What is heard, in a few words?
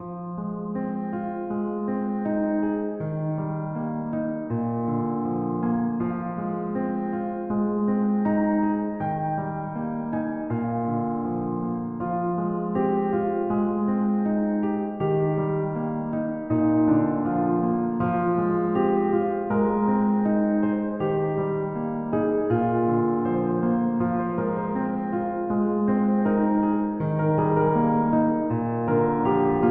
Pianovisation